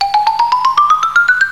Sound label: count up; American Poker The Legends II